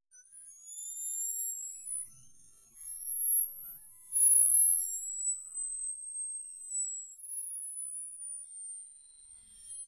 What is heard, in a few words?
sound of a tech hi tech